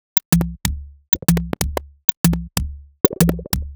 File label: 125bpm, House Drumloops